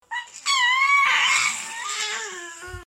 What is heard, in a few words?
Baby Sounds